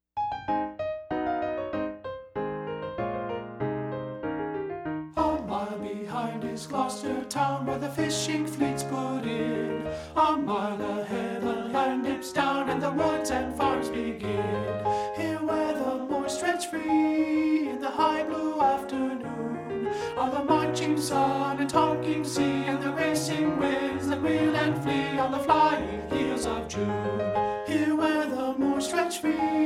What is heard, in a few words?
bass